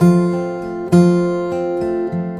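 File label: F major, acoustic guitar 100 BPM; Best Service; World Colours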